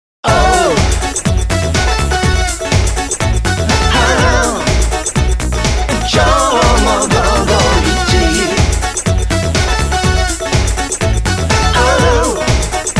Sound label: jingle